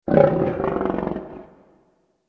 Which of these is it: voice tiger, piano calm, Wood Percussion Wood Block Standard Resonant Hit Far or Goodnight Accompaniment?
voice tiger